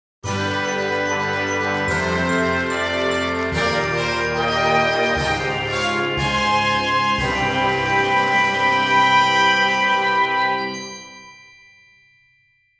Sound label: Medal Get Fanfare; Ocarina of Time